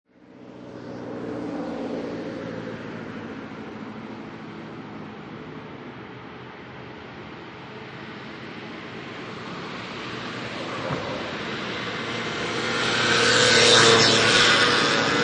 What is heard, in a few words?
Light Evening Traffic, Southern European Light Evening Traffic: Sparse traffic; Sparse traffic at southern european or latin town street